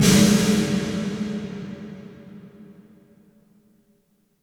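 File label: snare drum HALL; SD; Quadraverb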